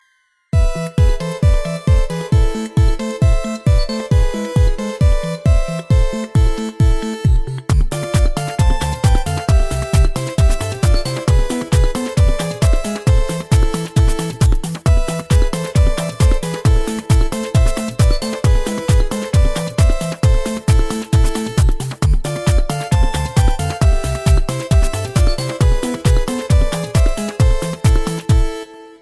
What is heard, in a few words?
Tetris Remix